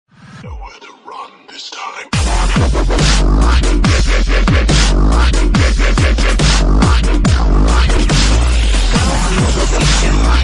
remix ringtone

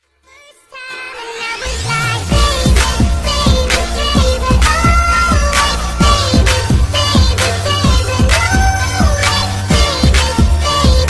chipmunk ringtone

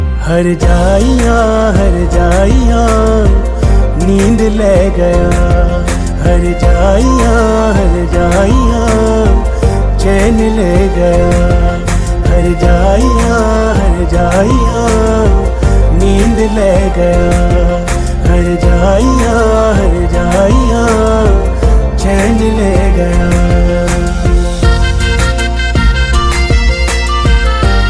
latest music hindi ringtone, bollywood

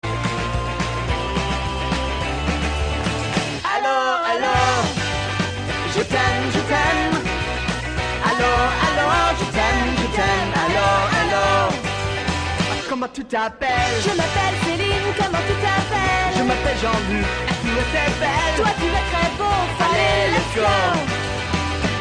advertisement ringtone; nokia

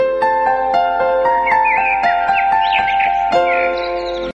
piano birds musical ringtone; iphone

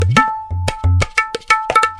tabla sms ringtone

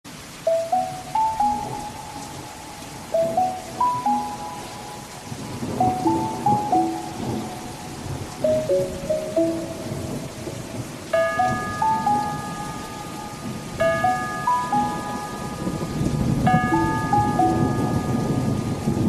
romantic piano ringtone; bollywood ringtones

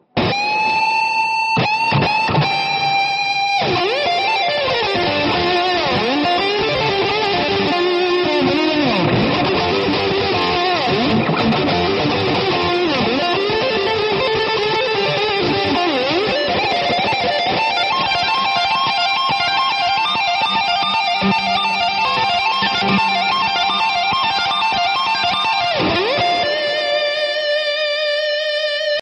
distortion guitar ringtone

